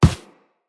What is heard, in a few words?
Run Default, Footsteps; Characters; Operator